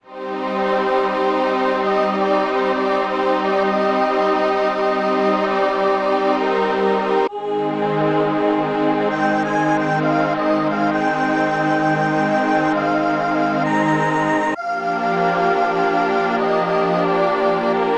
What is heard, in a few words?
Dungeon; Voidspire Tactics